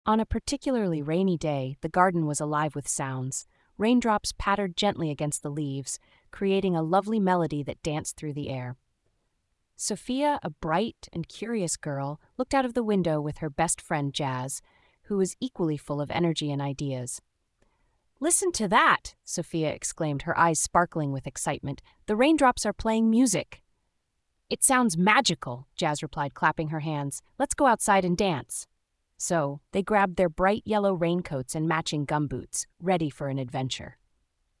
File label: rainy day melody